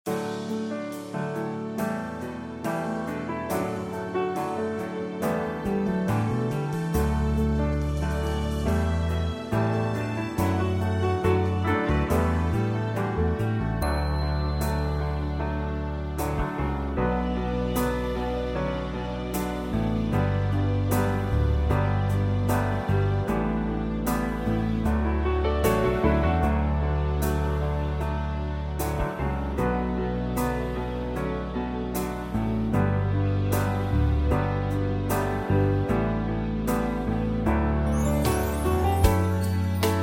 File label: D No Backing Vocals, backing tracks